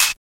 Natural Hi-Hat E Key, Royality free high-hat tuned to the E note. Loudest frequency: 5710Hz